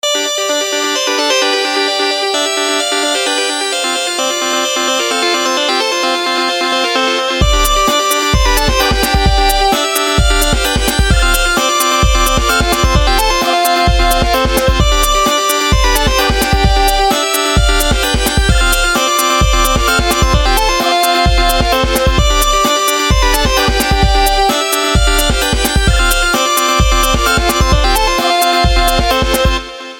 Retro

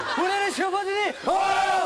Cheer

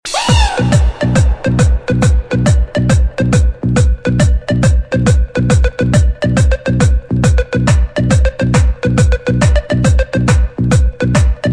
Electro Hymn

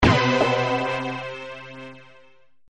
Sms

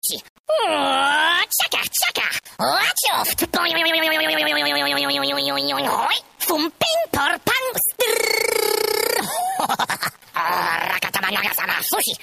Kung Fu Funny

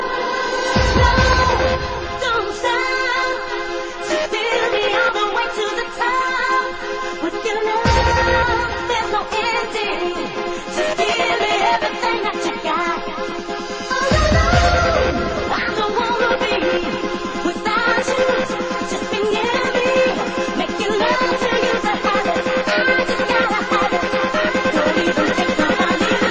Various Dance Remix

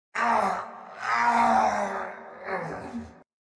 Yell; Nox

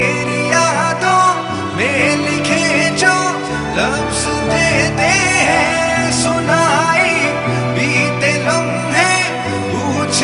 Xtrem Sad Tone

